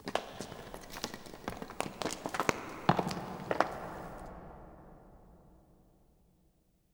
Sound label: Rocks; Inquisitor 3